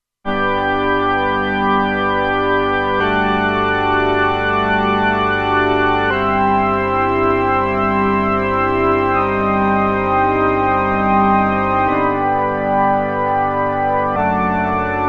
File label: Pipe Organ, Roland Alpha Juno-2; Original Sound Chart patches